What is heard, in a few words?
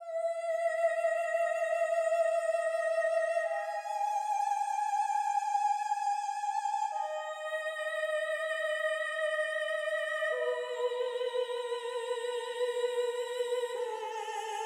soprano alto depths